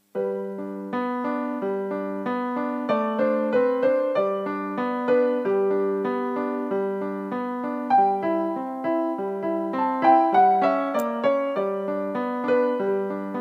K545 2nd movement

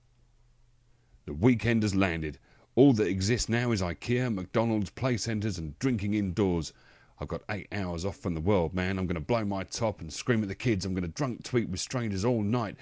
middle age human traffic

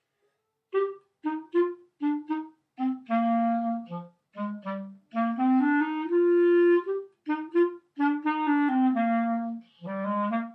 Country Jig

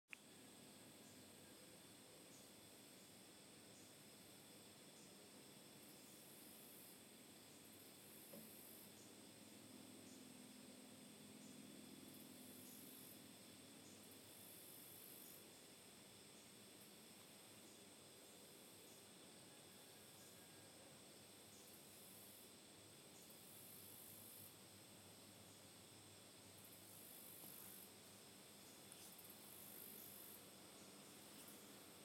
kitty outdoor ambience; little farm of horrors

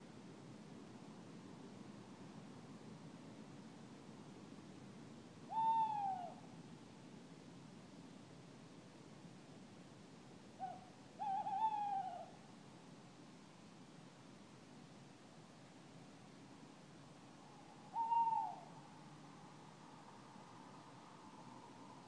tawny owl

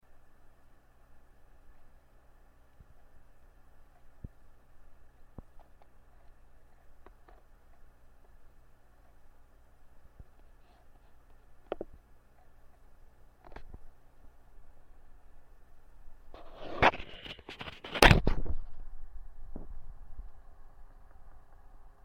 computer

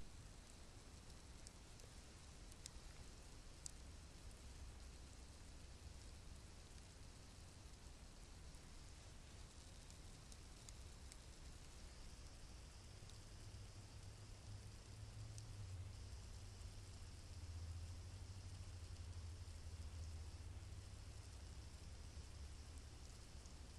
campfire in canterbury

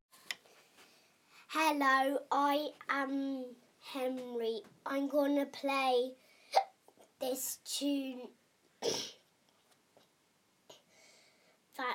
trombone practice

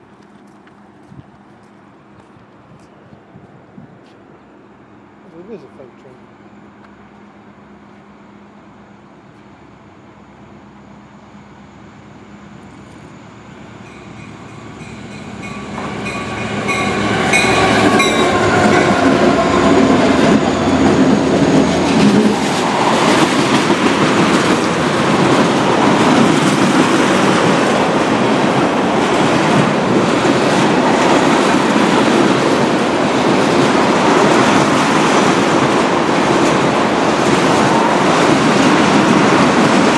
freight train passing through the station; schaumburg

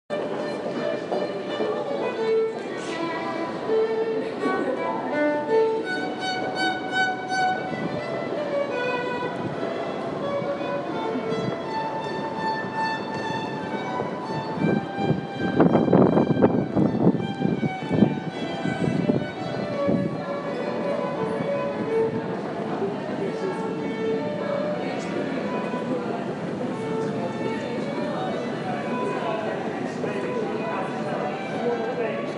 amazing violinist at st pauls tube station

